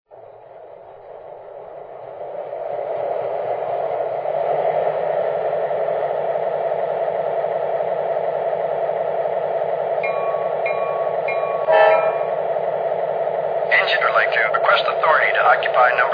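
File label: SW9, locomotive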